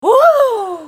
spell cast effort; JannaForecast

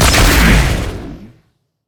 explode; LoL; velkoz skin01

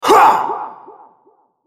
effort; RumbleSkin03

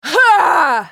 spell cast effort, en US; Sivir

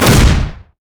fracture; LoL; skarner

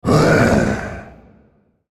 spellcast effort; Yorick; en US

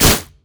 thorn plant; Zyra; LoL

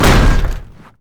basicattack; LoL; trundle

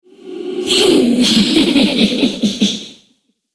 spider laugh; Elise

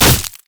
spider basic attack; Elise

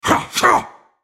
spell cast effort; Renekton

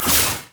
carpet bomb; rumble

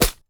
vs flesh; LoL; sion

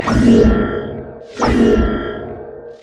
heartbeat; LoL; hunter rengar

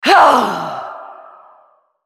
spell cast effort; Lissandra

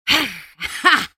spell cast effort, en US; Elise

